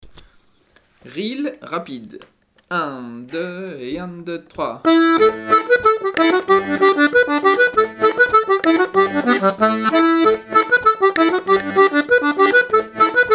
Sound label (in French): reel rapide